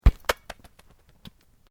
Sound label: Sport Soccer Kick Concrete, Soccer ball sounds